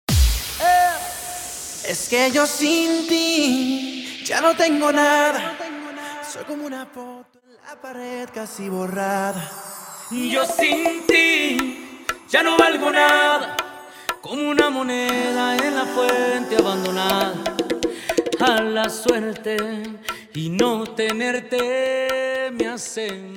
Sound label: Acapella Starter 120BPM